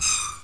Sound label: overshoot